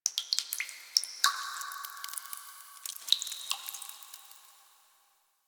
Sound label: Ocean Cave Drips; Warframe; Grineer Ocean